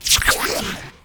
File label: Monster Ghost type Death; Inotia 3